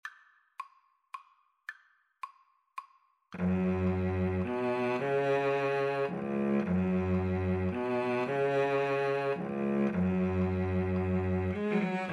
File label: cello duet